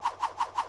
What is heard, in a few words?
spin; Gumball - Go Long!; darwin